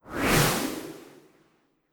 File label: Crystal Catalyst Blow